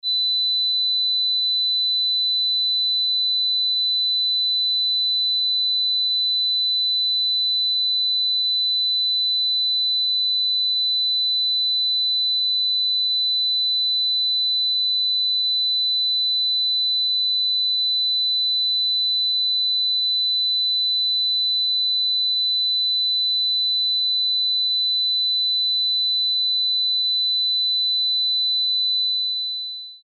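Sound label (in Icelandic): blísturshljóð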